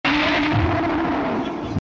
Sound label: Roar; Pulgasari